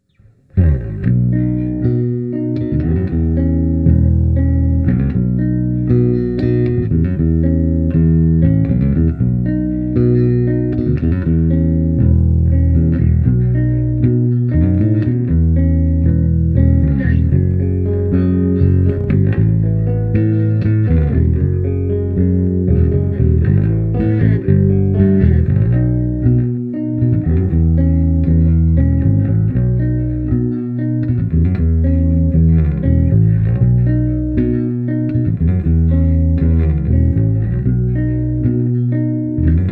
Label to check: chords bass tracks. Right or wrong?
Right